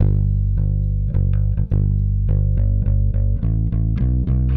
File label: Clinical Bass; Sonic Foundry (Sony Creative Software); Indie Rock Anthems; Session 02 - Clinical